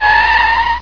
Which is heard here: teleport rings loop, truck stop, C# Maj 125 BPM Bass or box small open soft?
truck stop